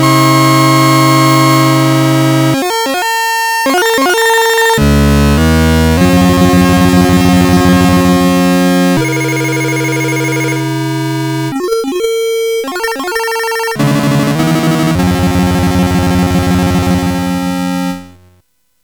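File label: Reactor Run, MOS 8580 SID chip music, games; CSG8580R5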